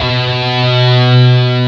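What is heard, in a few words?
DIST GT3 B1, distorted guitar; DIST GUITAR3; AKAI S-Series CD-ROM Sound Library VOL-7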